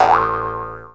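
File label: pot bounce; lomax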